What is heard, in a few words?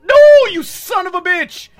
bam sob